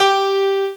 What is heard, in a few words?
grand piano G3; Star Trek JR